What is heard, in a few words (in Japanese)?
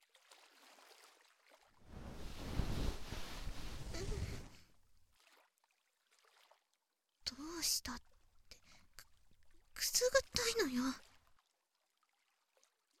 左耳かき